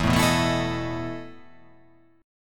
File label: E Major 7th Suspended 4th E